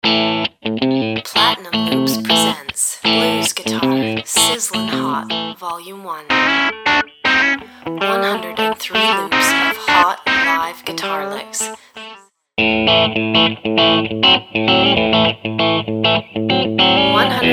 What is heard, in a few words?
blues guitar sizzlin hot